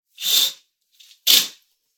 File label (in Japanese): カーテンを開ける音; curtain